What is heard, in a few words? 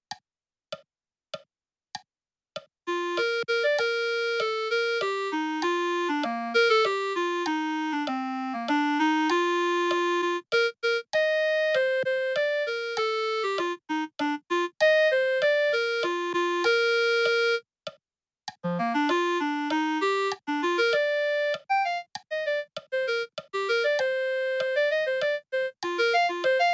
clarinet; janfest